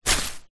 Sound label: footstep single long grass; foley; zapsplat